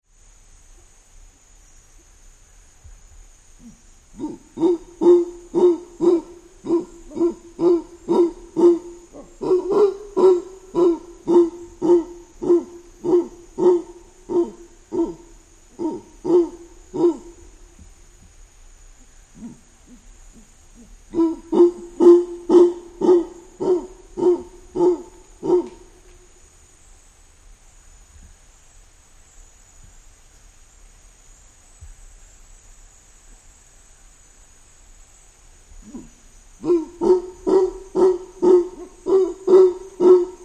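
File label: mantled howler monkey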